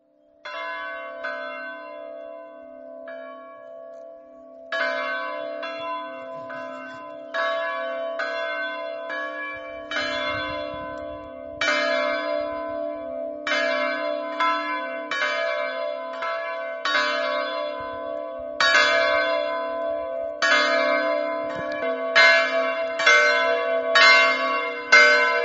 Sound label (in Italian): campane; seufemia